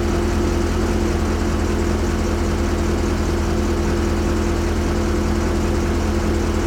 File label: car engine idle